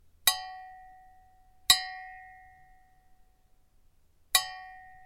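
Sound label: triple light clinking of glasses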